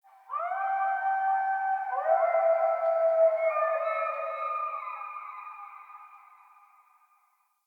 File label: Distant Pup Howls; Cinematics; Free Orengall; Orengall Quest